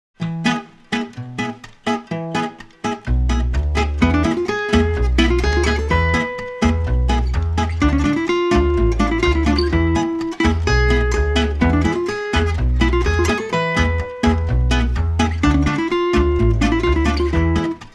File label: Jewish Klezmer without accordion; audiojungle